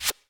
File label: Unlock, System; Samsung Z2; feedback; Tizen